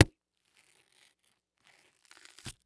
Horror Scary Violence Butchers Knife Stabs Body Pulled Out